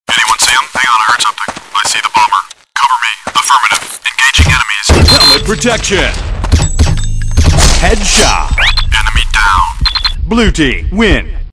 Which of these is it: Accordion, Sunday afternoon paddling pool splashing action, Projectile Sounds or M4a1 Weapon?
M4a1 Weapon